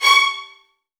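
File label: VN FX C#3 left; ILIO; Kirk Hunter Virtuoso Strings VOL-1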